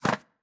Fly out jump; Tsutsukera

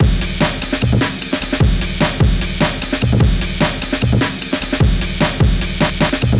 Amen Break 165BPM

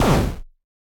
Canceled; Going Under

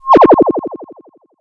UI Deactivate; UI MagicItem Deactivate 01

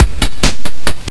Beat loop; Guitar Rock Tour

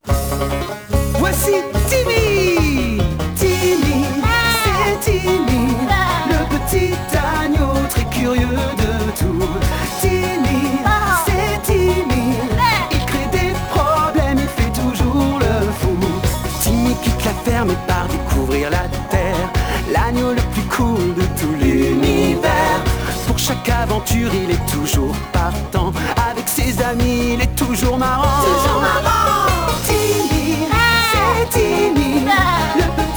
Theme Song (French); Timmy Time (DS) (gamerip) (2011)

